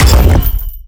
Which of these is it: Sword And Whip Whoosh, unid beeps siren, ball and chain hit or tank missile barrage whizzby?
ball and chain hit